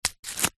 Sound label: foley card dense hard snap rip